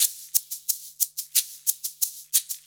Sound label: 90 SHAKER